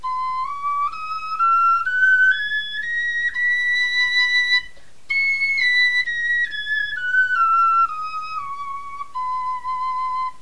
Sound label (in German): tonleiter, knochenfloeten